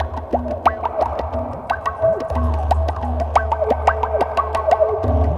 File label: Ambi Groove